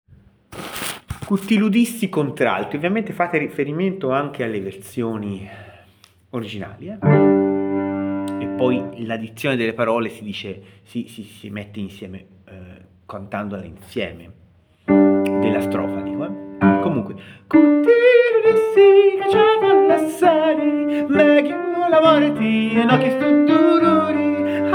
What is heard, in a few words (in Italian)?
alto